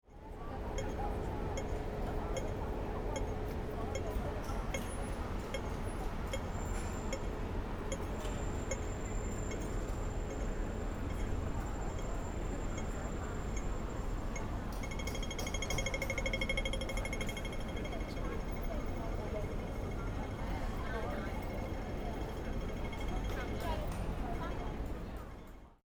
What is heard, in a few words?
beeps bounce